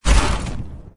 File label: boss explode; Danger Mouse - Ultimate